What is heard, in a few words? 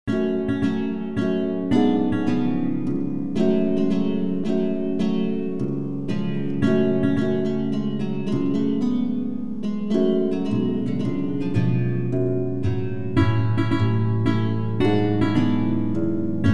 mazurka, torban